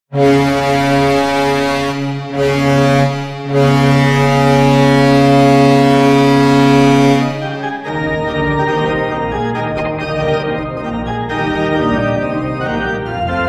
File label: Goal Horn; STL